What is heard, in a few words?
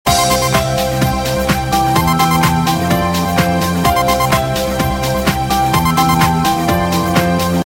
Samsung ring, ringetone